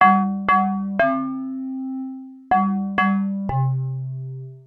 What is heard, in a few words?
perkus